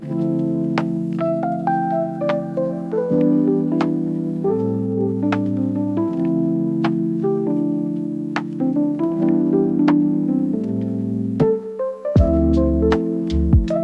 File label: instrumental